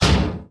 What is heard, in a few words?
Short Blow